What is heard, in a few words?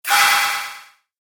Arcane Magic Stun Spell; Magic Sound Effects